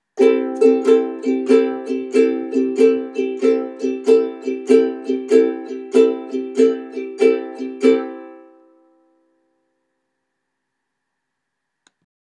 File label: Open Strum